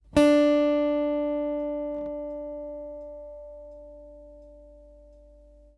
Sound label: Note D, guitar